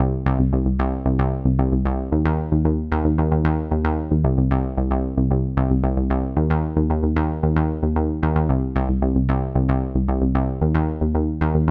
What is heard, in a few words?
bass on lp